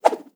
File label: Swoosh Multiple